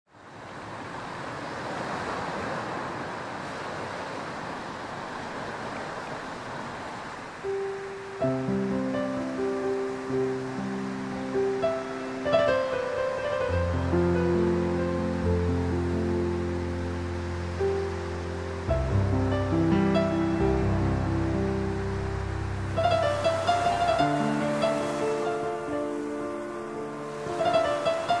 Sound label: piano instrumental relaxing music